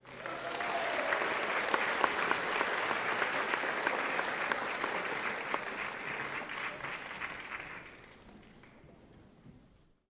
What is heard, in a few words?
human audience theatre applause cheer